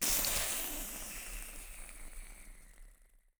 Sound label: count Up Cooling; netent